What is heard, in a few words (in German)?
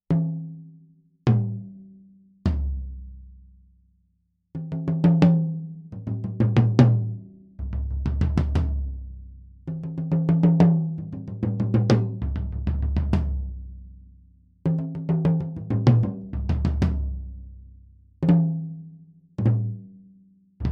Toms STEIL; sE Electronics V Beat